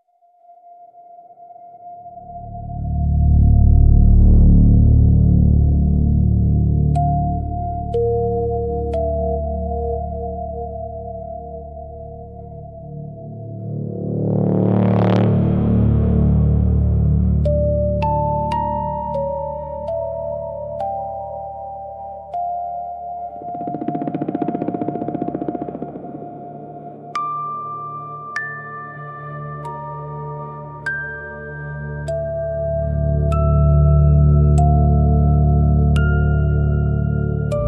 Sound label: spiritual spooky soundscapes